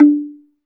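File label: SLITDRM D4 F, slit drum; SLIT DRM1; Heart of Africa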